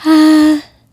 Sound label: "Hah" D#3, Swingin' Single Notes